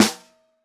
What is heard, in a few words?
Snare Bottom